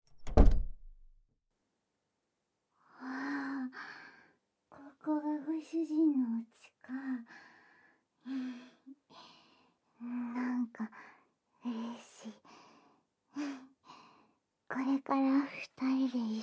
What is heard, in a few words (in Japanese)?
子ども体温のおててであったか耳かき（膝枕・耳かき）, ASMR